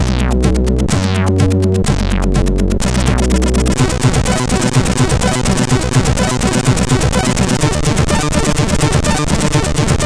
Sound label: Little Thrash, CSG8580R5; hvsc